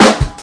12bit Soul SN, Dirty Hip Hop Kit; style kits

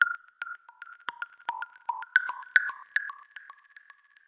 echo bops; SYNTH AND ELECTRONIC LOOPS

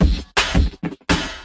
165 crunchy hold your breath, drum loop; 161 to 180 bpm

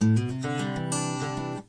guitar; MELODIC SAMPLES

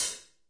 Hat A3 Cl ff; REAL LIVE KITS

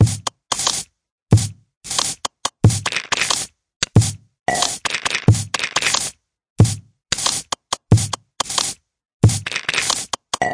Off Target Found Percussion

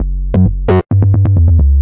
16ths subba bass; BASS LOOPS

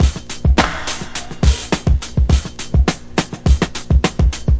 classic break, drum loops and breaks

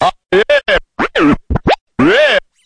90 bpm, scratch that vox, drum loops and breaks

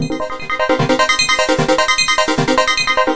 150 bright bubbly sequence; SYNTH AND ELECTRONIC LOOPS

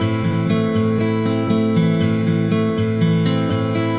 acoustic guitar picking

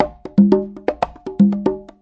090 bongos, 090 bpm; Bazillions of Bongos

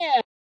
formant filter vox hit h, Synthesized Voice Hits

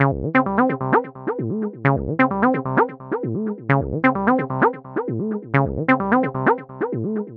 got acid on my 303, synth and electronic loops

